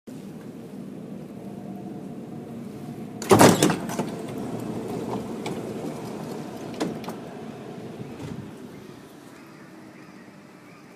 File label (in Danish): s-tog door; S-tog døre #1; 4. generations S-tog (Litra SA) ydredøre åbnes.; Michael Hansen, Sommer 2012, 4. generations S-tog